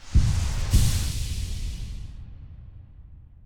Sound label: heal clean; hollow knight